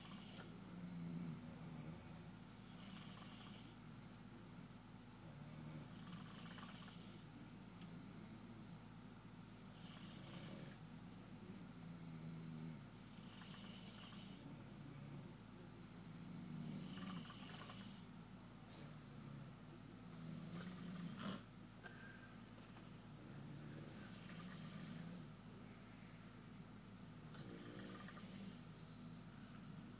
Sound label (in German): 14 männer schnarchen im chor